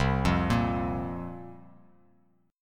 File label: C 7th Suspended 4th, guitar; C7sus4 arpeggiated